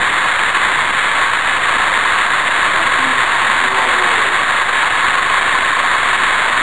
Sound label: ghost